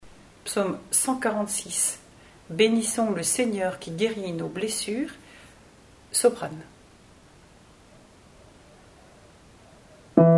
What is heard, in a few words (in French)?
Psaume 146 Bénissons le seigneur qui guérit nos blessures SOPRANE; Gouzes